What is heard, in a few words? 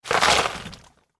stones and water on cement